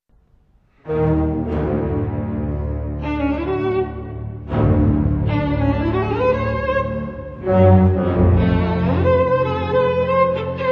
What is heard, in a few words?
violin concerto